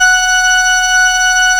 SIEL, String Machines

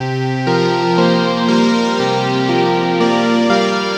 Lead, Synth Textures

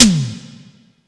Tom Electro, Yamaha PSR E305

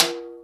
Timbale, E-MU PX-7 drum machine

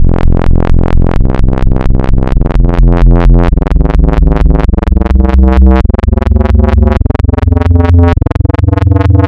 Spectral, wavetables; Architecture 32

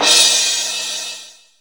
mallet crash, Yamaha RX-5

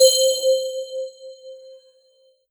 VoxBell C5, DX11; Giga Samples Collection; Bells

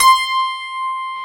PIA OLD, PIANOS

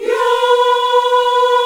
B 4, CHOIR YAS, Africa; Spectrasonics; Heart of Africa 1

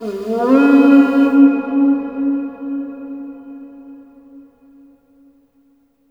HRNPHR, Heart of Africa; AMB. HRN FX

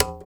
EQ Perc, Percussion Mix

